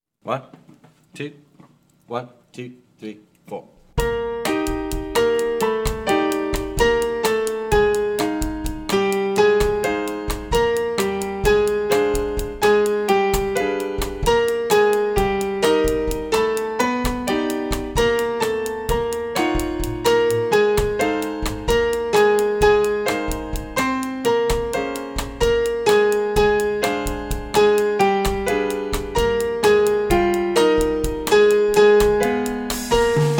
latin etude bass muted

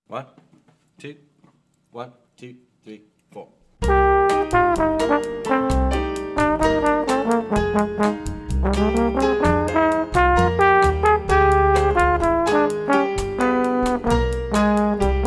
latin etude trombone

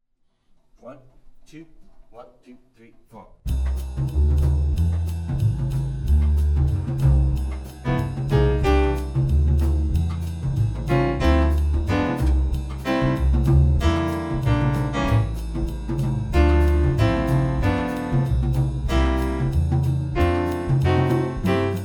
latin etude rhythm section guitar muted

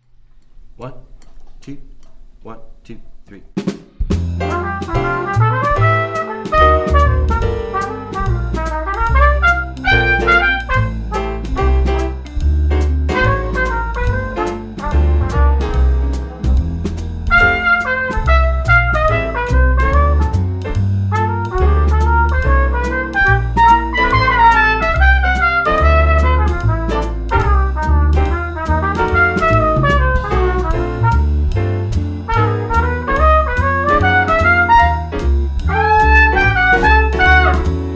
swing etude trumpet

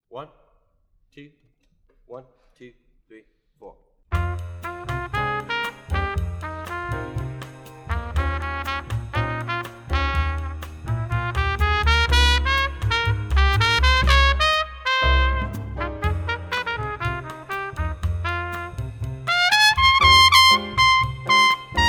latin lead trumpet

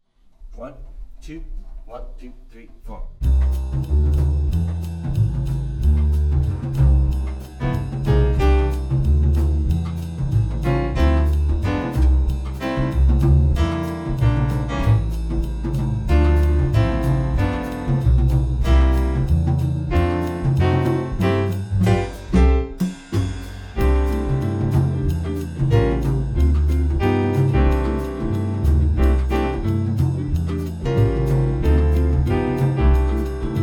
latin rhythm section

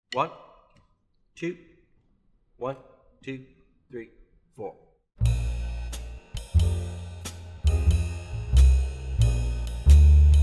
swing piano muted

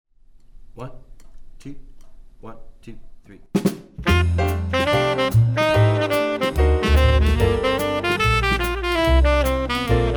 swing etude tenor sax

